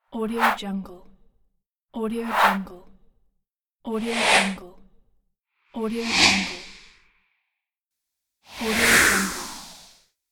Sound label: woosh